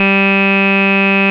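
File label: OB8 organ C2, OBERHEIM OB8; Big Fish Audio - First Call Vintage Keyboards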